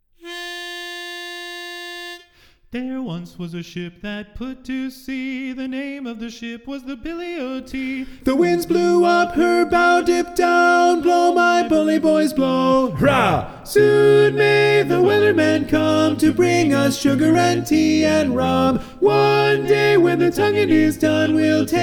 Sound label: Sea Shanty Medley TTBB Tenor